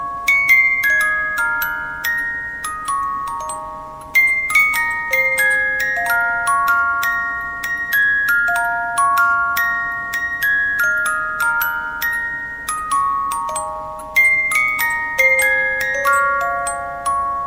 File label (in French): melodie boite a musique